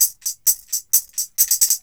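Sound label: TAMB, tambourine; Drumdrops In Dub VOL-1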